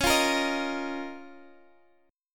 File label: C sharp 6th Add 9th, Guitar, Standard; strummed; C#6add9; x,x,11,10,11,11; JGuitar